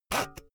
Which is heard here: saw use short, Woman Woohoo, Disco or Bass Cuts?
saw use short